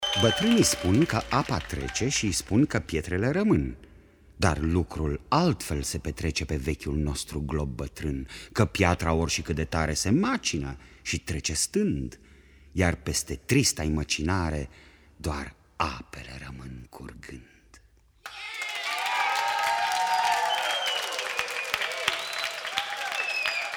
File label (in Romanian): Pasarea Colibri Ciripituri Poezie Folk